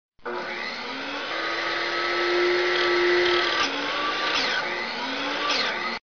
small table saw

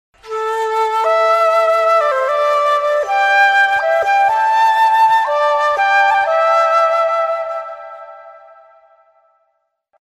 sad flute ringtone

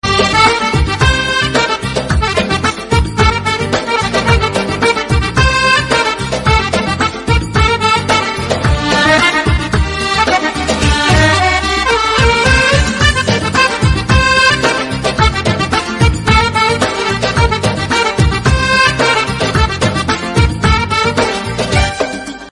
music arabic ringtone

